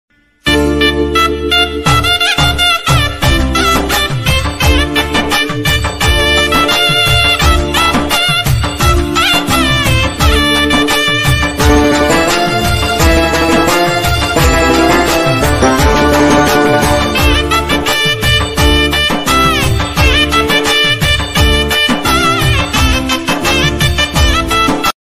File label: Ayyappa samy ringtone bgm